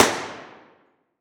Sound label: Weapon Gun Rifle M16 Single Shot Interior Shooting Range; AdobeCC